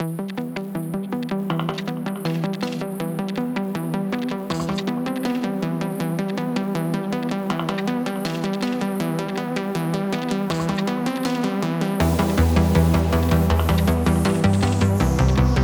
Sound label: Space Station; Music; loop